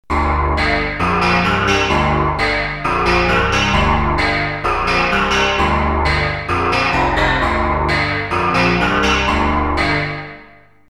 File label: PPG Bass